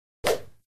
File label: Badminton Hard Shut